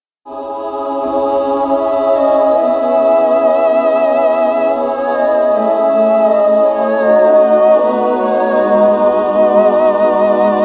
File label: vocalise